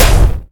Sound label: Hit Open